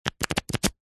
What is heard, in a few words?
playing cards flick through shuffle; zapsplat